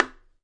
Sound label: 8 bongos bongo; ETHNIC and WORLD PERCUSSION; Eight Bongos